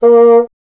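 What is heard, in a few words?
wind bassoon A#; Miroslav Vitous - Solo Instruments VOL-1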